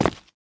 tile, Players

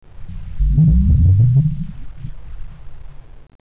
Idle, zombie; zombie amxx ru

